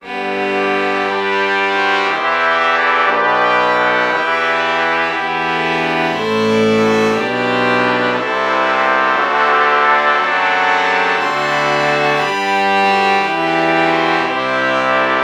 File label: violin trumpet bach morph